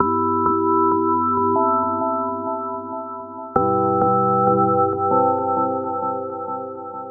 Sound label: Bpm135 Organic Pads C; Airy; loop; LonelyVibes; Prime Loops; Dubstep Illusions